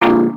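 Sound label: upgrade yellow, ships; Cosmic Rage; ships misc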